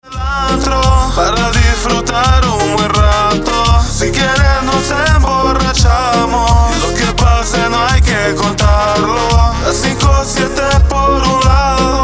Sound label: REGGAETON BELICO